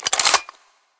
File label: coin Wings; hiddencoinsofegypt